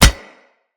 Scythe Catch; Weapons; Reaper Scythe

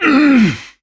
Struggle Short; New War; Ballas

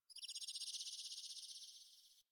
Venus Landscape Distant Corpus Texture; Ambience; Warframe; Venus Open World

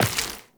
Step Flesh, Footsteps, Walk; Warframe; Tenno

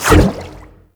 Aqua Board Spin; Warframe; Yareli

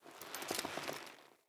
Foley Move Short; Friendly; Deora

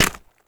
Bone Effigy Impact Hit; Gameplay; Warframe; Tau; Destructible Dynamic

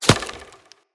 Bow Arrow Impact Vegetation; Weapon Impacts; Player; Bows; Environment; Hunter; Envoy Hunter Bow

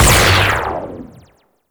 Clone Ability Hit; Warframe; Amalgam Sniper

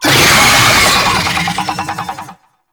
Boss Vocal Alerted; Enemies; Vocals; Warframe; Oraxia

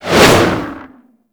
melee slam generic whoosh; Warframe; weapons

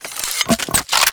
Rocket Launcher Reload; UT Weapons

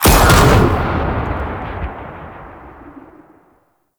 Pylon Proj Fire C; Enemies; Weapons; Warframe; Arachnoid Camper

